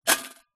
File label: foley money collection tin coins inside shake single; zapsplat